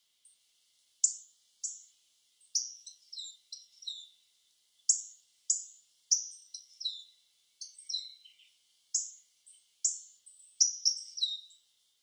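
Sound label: Hawfinch; utsunomiya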